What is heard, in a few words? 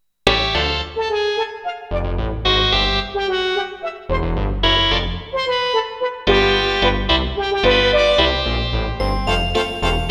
polka